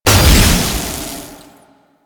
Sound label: Loot Crate Energy; Warframe; Entrati